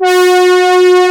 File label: BRASSY; East West; Global Sounds